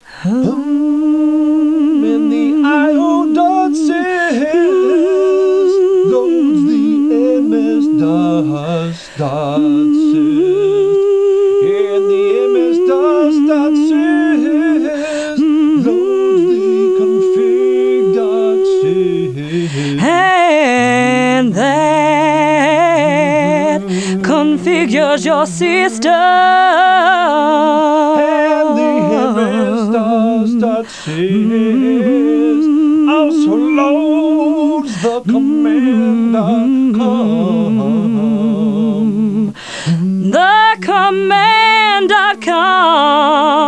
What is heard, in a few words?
singsong; How Multimedia Computers Work; English